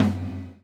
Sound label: GATE TOM, Drums Acoustic; USB Soundscan vol.10; AKAI; 90s sample CD; GATEKIT 3